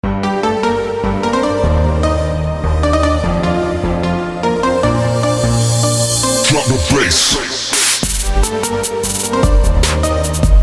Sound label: Light Trap